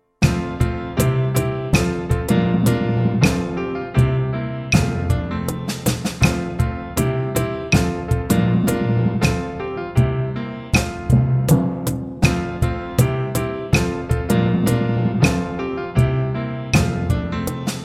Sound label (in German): Ambergerhymne playback ohne Vorspiel